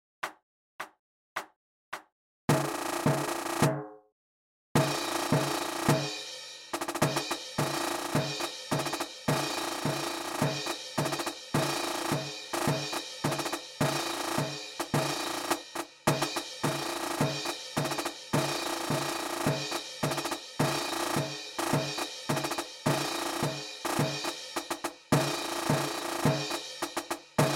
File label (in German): Perkussion, Spielmannszug; Edinburgh Tattoo; Scotland the Brave